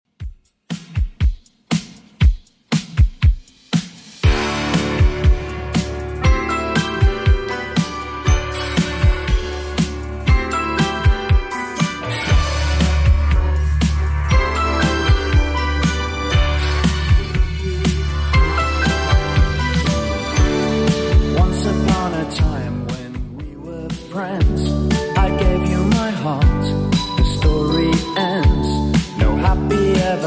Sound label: 80s Redrum, 119bpm